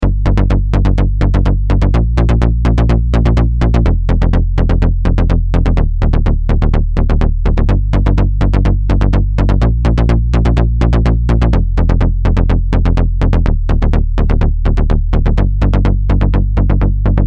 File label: Roland SE-02 Discobass